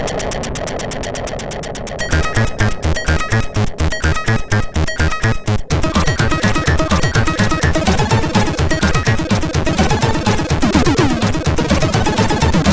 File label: Heavy Beat, MOS6581R4